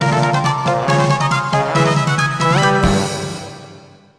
Buildup, Fanfare; 1997 MMX LAN PACK (win 95); Worms Armageddon

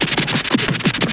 mole bomb digging loop

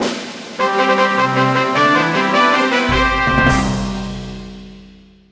Royal Banquet, Fanfare; 1997 MMX LAN PACK (win 95); Worms Armageddon

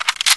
distance pickup; 1997 MMX LAN PACK (win 95); Army Men

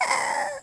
cough

